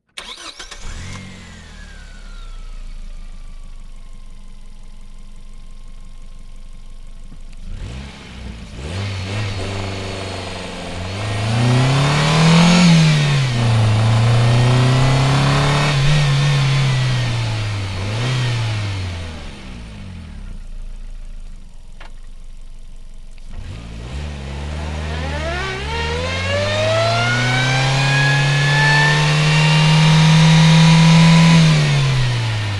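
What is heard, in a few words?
ford probe onboard start fast drive stop reverse stop drive stop off engine right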